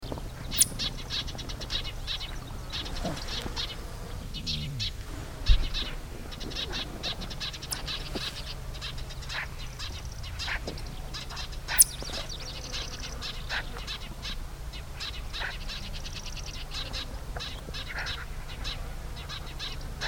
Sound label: Daurian pika Ochotona daurica alarm; Khurkh